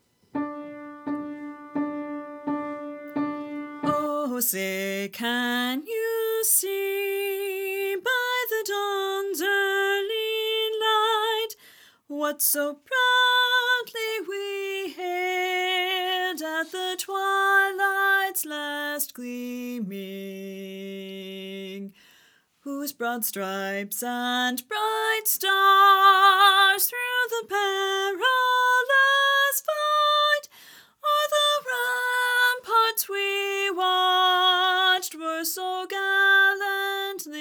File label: melody with count in